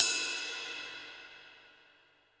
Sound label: Pop Ride, cymbals; Roland BK-5